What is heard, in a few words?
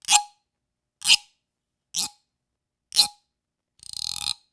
Guiro